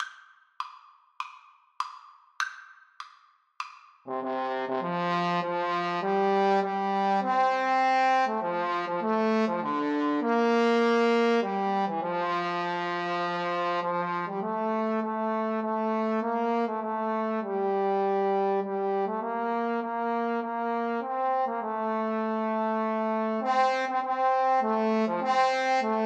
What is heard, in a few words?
Trombone Duet